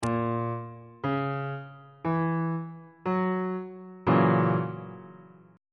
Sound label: g flat dominant 7th 1st inversion on bass clef